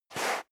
step dress boots sand slide short; schritte; menschen

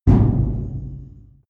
medium metal impact

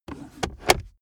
car accessories center console drawer close fast; autos; verkehr transport

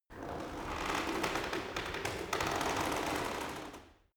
cart medieval creak short; kutsche; verkehr transport

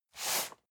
cardboard box medium slide short; objekte

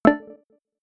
notification signal

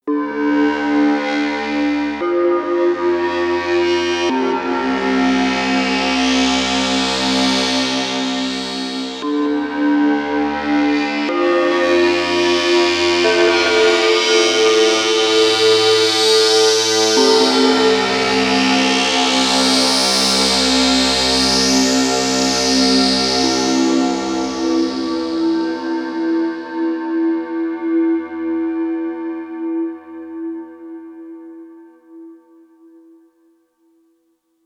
creaking melody; flaechen

